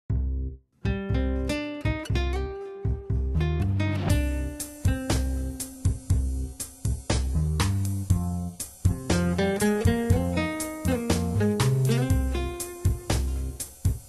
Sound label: Rainy Guitarres